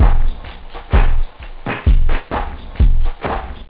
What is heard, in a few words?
faaast, instruments wave loop house